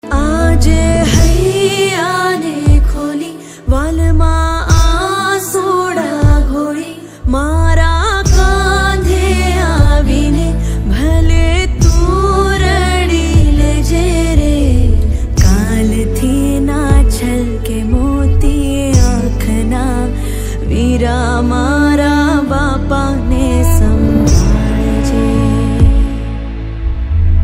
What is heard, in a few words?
gujarati ringtone